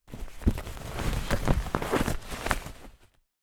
leisure toy inflatable handle movement

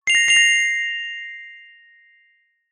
fantasy magic chime ping wand fairy godmother

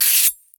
Gore Cut Slice

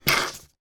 coin slide and pick up from table; foley

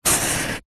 office marker pen draw line on cardboard sheet

